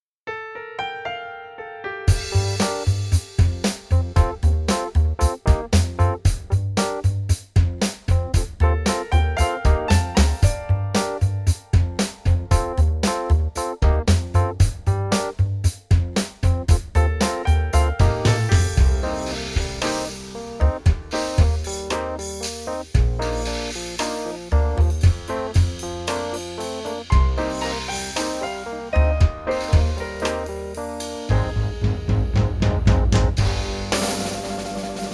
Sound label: bright hall; tascam; craviota